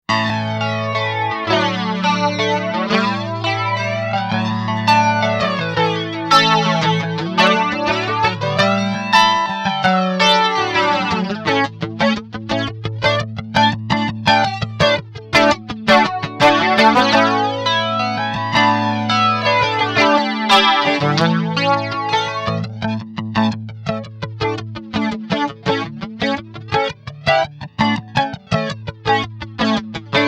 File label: Maxon FL9 Classic Flanging Effect